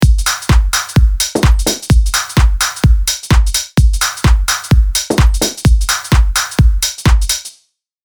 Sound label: Champagne, 128bpm, Drum Kits